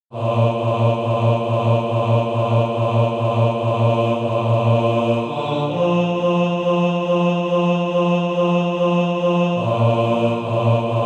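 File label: bass, Practise Music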